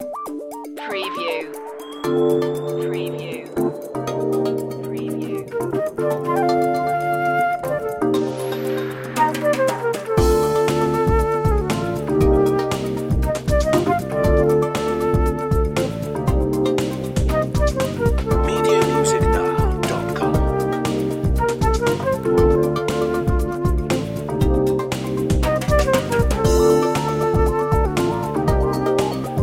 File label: flute and fruit